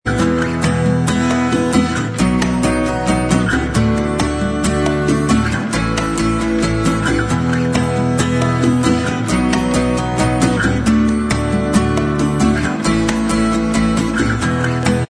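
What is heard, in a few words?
acoustic Instrumental Track, backing tracks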